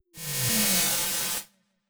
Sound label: fires